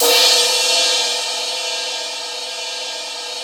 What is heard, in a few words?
crash; East Collexion; Drum 1 Dry